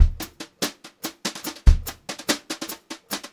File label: 144 vari, Brushed Drum Loops